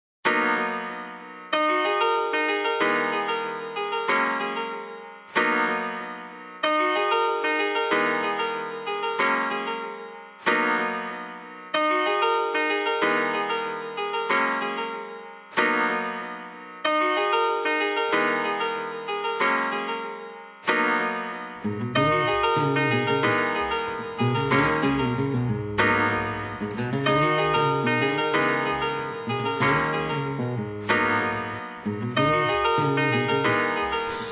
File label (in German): Rap